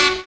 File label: HIP SAX; Univers Sons